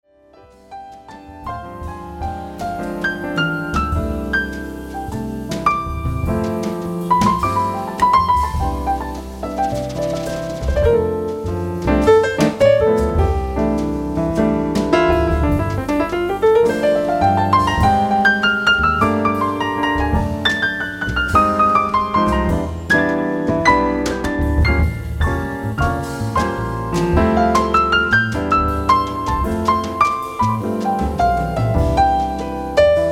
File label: trio